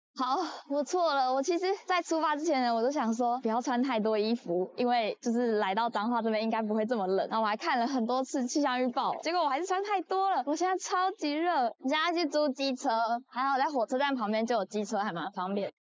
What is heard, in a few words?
vocal